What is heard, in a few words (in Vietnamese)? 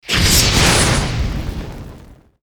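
Âm thanh phụ đề sấm sét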